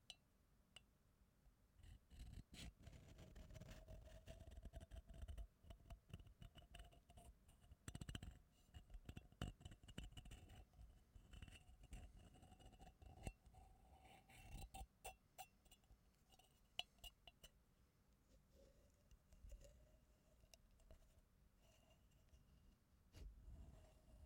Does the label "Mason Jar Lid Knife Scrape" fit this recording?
Yes